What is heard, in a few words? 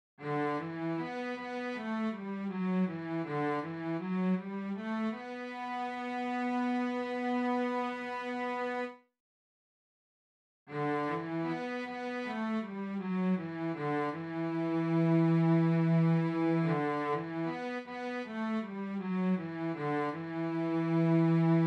Cellos; Marshall JVM; TONEX Capture